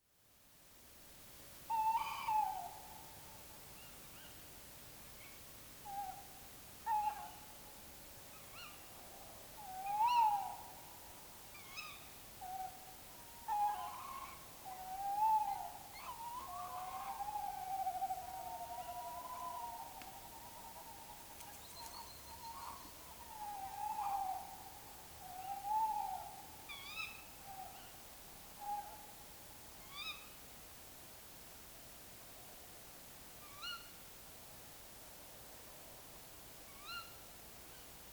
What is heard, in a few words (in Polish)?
glosy ptakow puszczyk samiec samica; avesnature